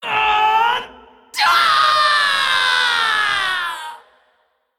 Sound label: Female Dragon Undead Death; Undead Onyxia